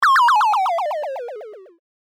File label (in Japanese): 場面切り替え ミョミョミョミョミョミョミョ 下り